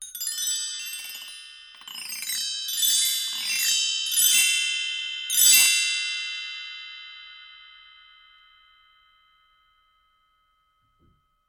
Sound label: Bell Tree Gliss Sprinkle Spray